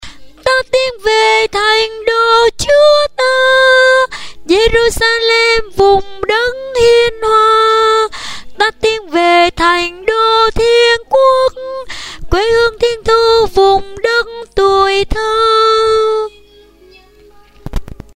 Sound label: tenor basso